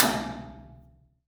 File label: impact metal tank stick; Phasmophobia